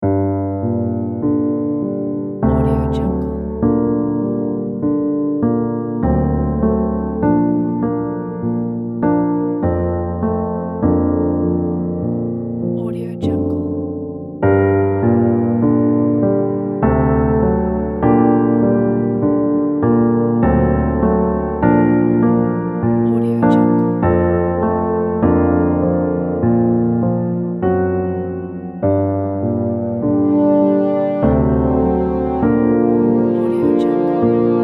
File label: piano